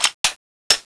clip in, weapons; sapientia

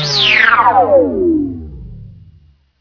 jelly kill; bikibot

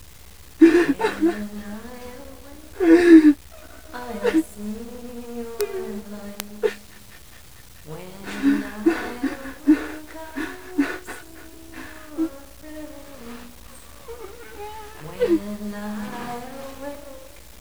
cry loop; cstrike; kzsca bastion

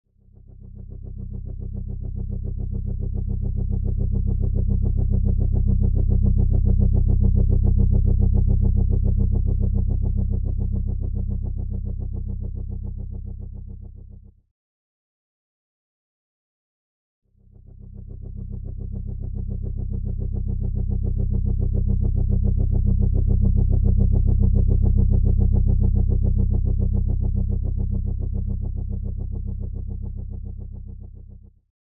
magic jazz; cstrike

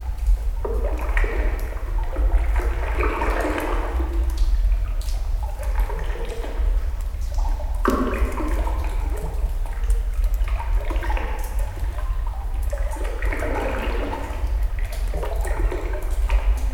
water rivulet loop; cstrike

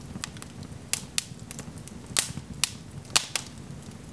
blue flames; cstrike

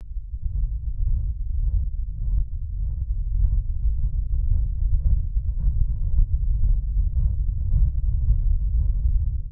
space echo; cstrike

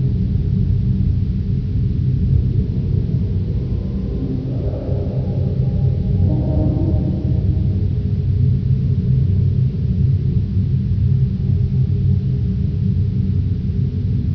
tunnel; cstrike; kz kzsca bhopindustry

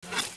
patrol drone fly; cstrike

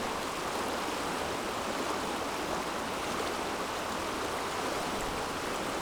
River; cstrike

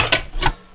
flamegun clip out, weapons; cstrike

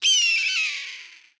eagle; wild; cstrike; kz wild

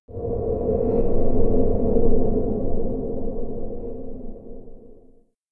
abstract hit; cstrike

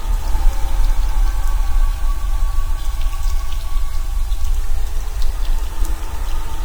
corridor water; cstrike

